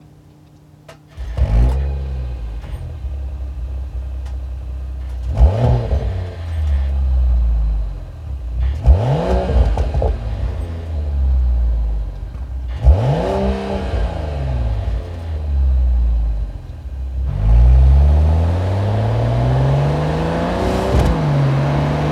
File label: Stock Exhaust Mercedes AMG CLE 53 4MATIC C236; cabriolet c236 a236; akrapovic slip-on line titanium